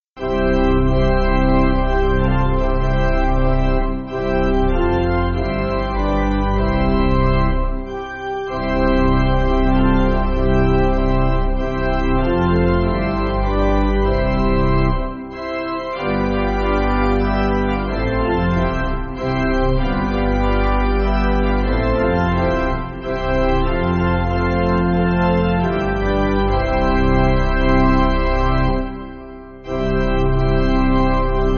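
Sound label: Pipe Organ, Backing Files for Churches; Philip P. Bliss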